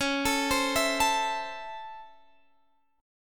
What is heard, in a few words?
Db Minor Major 7th Sharp 5th Db down Guitar Open G